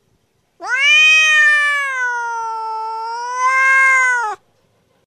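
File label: cat sings meow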